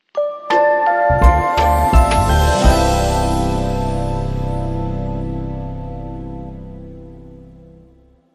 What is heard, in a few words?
Short Sting